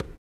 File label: CONGA; E-Lab; X Static Goldmine 2